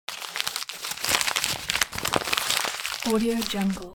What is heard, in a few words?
foley office paper scrunch; smartsoundfx